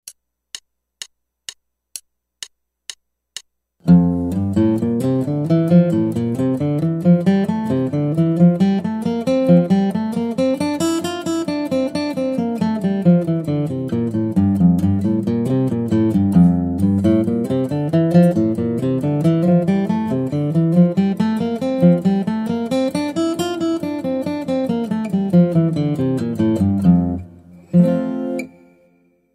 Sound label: Guitar